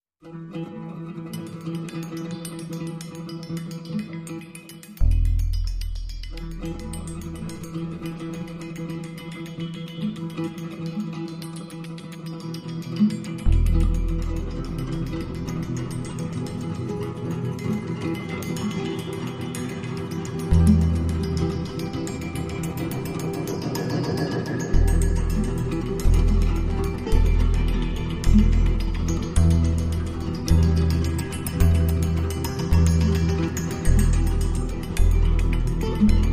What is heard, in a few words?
Ticking Guitar, Underscore